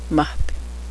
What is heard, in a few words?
water